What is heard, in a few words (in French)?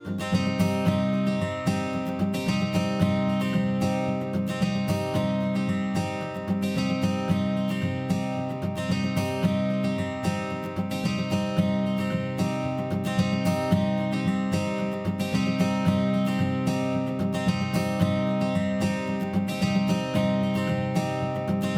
riff guitare; s1 waves puis ozone